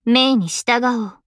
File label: applause; osu